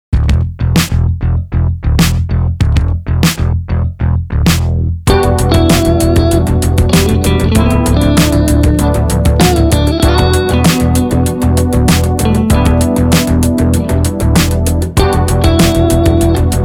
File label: blues